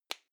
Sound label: lipstick close soft; haus-garten; badezimmer